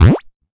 star knocked; Wrecked

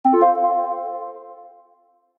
round completed; DuckShooter

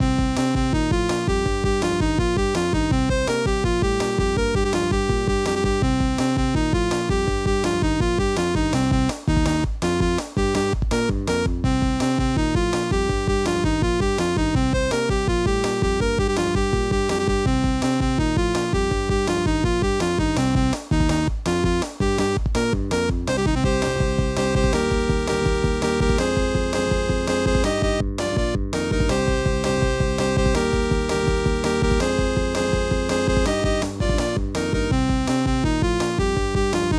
arcade fast flow; Fruit Hunter

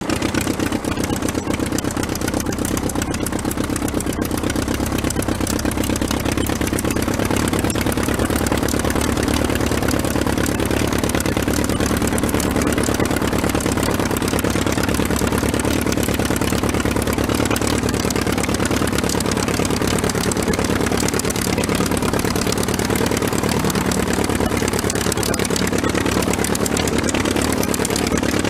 motor running aircraft; The Aero Guy

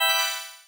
jewel; Metal Bot

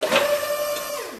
open door; Alpha-E-Corp

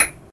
shoot; Space Shoot

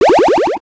spawn; Season Land

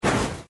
crash; Wrecked

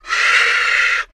zombie dead; Zombies Night

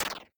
enemy hit; Season Land

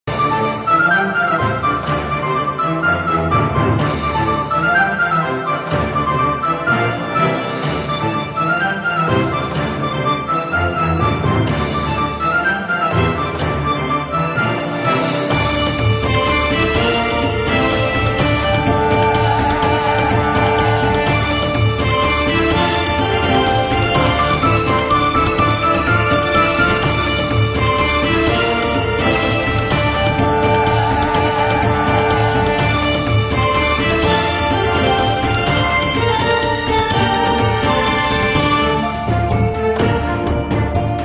game fon; Rob Runner

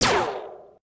gun; EarthAttack

